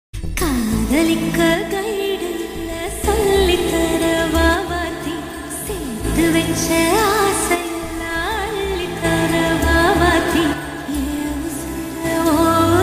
slow reverb, tamil ringtone

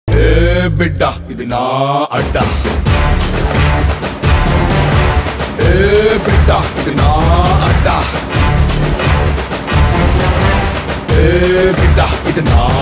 Telugu ringtone

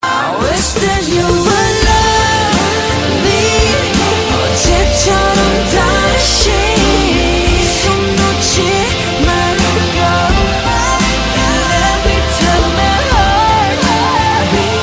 korean ringtone

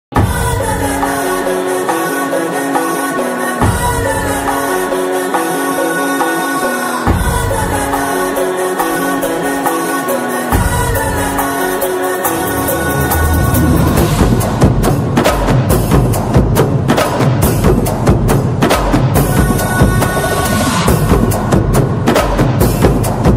BGM ringtone

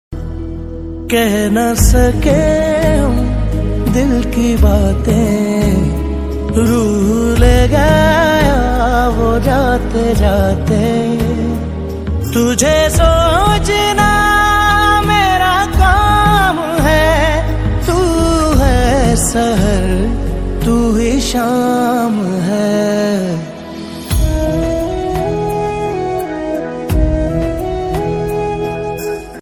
OST Pakistani ringtone

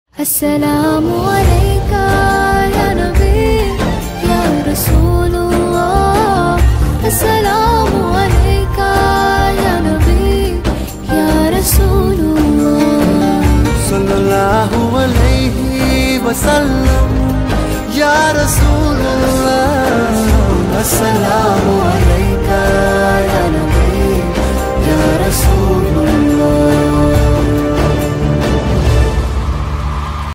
arabic ringtone

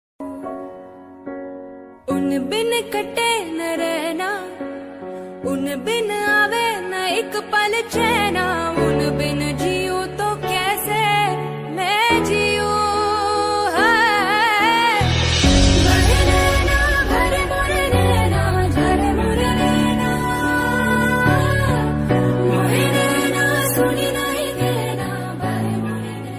female version ringtone

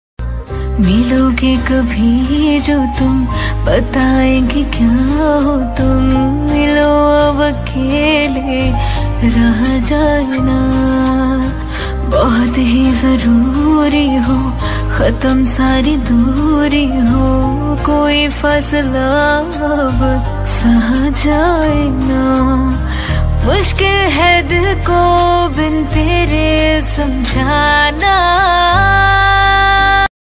Female version, hindi ringtone

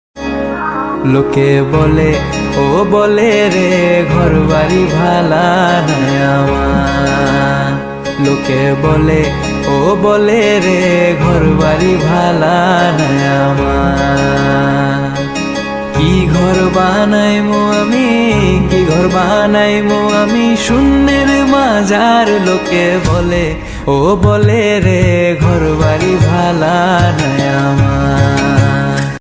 bengali ringtone

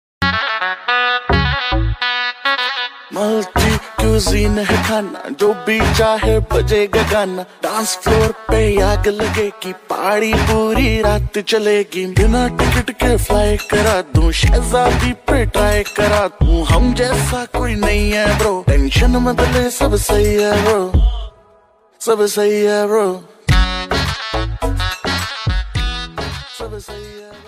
rap ringtone

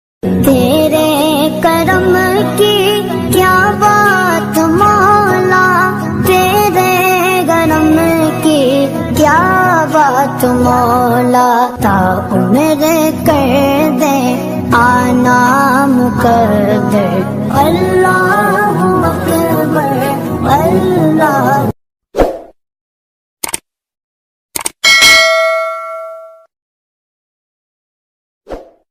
ringtone

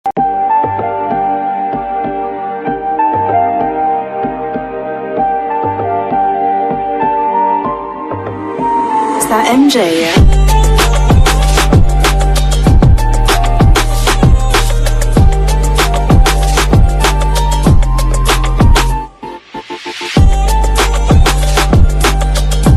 Remixed tamil BGM ringtone

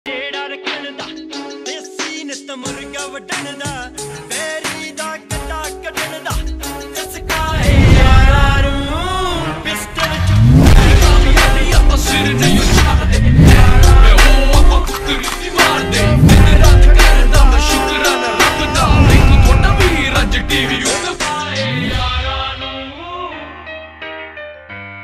slowed reverb hindi ringtone

